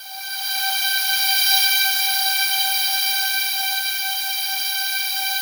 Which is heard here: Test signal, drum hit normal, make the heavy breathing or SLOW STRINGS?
SLOW STRINGS